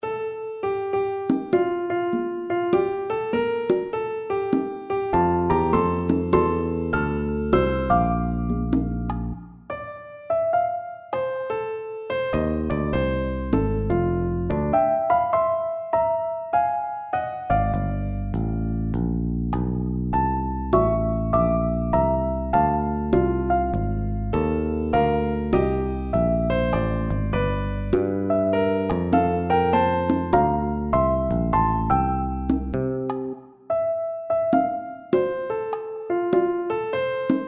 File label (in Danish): Musik over digtet; Organisten Jane.